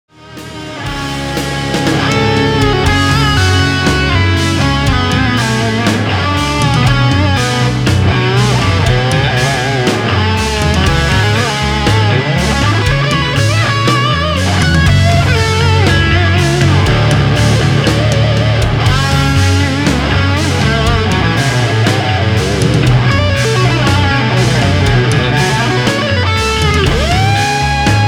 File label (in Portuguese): Solo lento